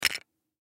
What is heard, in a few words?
Flashlight Insert, Foley Flashlight Insert Battery Plastic; Flashlights; Electronics; Blastwave